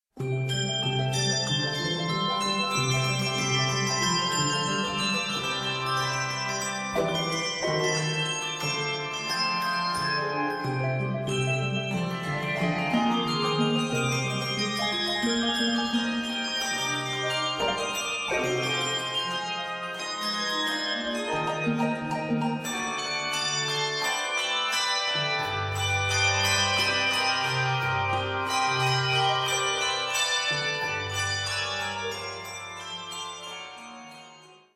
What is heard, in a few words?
Music box